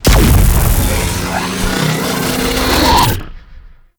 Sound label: Corpus Arachnoid Camper Laser Sweep Fire; Warframe